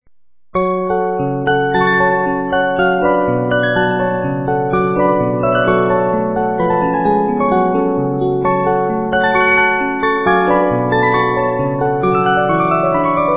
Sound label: Hungarian Dances No 5 3 Parts Simulation, 72-Note Movements